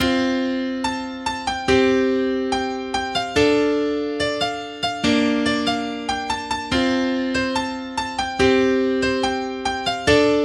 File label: Piano